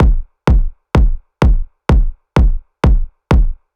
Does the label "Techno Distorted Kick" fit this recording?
Yes